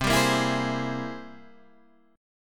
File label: C 7th Flat 13th C, chord